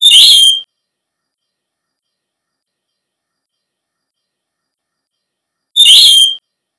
escarchero

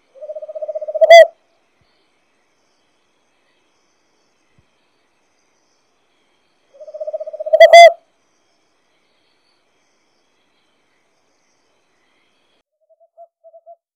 tamborcito

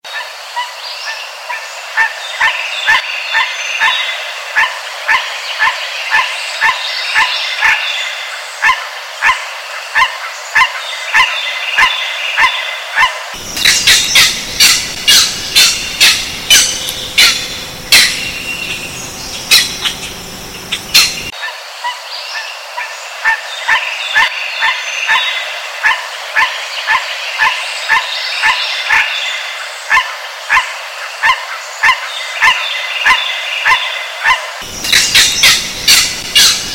Butorides striatus - Garcita azulada

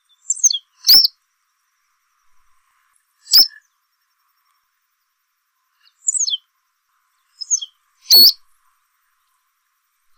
capuchino de boina gris

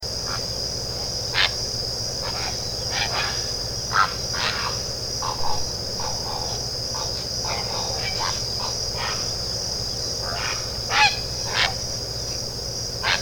garza mora

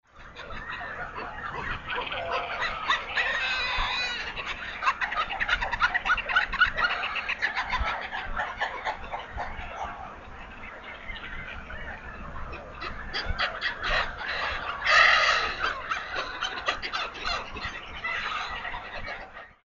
Fulmarus glacialoides - Fulmar austral

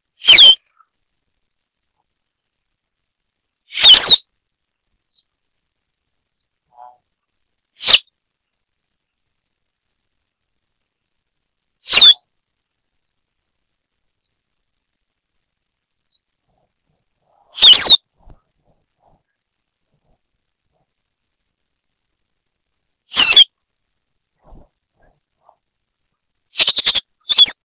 Sporophila bouvreuil - Capuchino boina negra

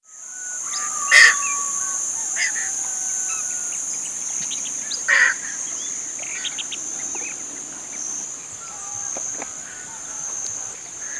garza azul

